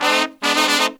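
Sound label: Pop & Funk Brass, 110 F, left; Best Service ProSamples vol.25; AKAI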